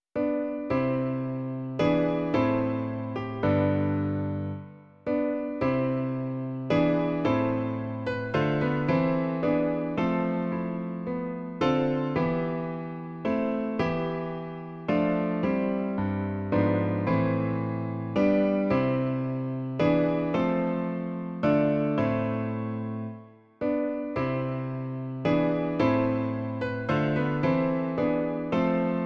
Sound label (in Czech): klavír; Klavírní verze